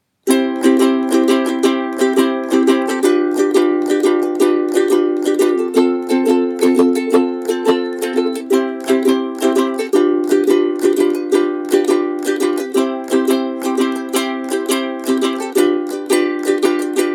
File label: uke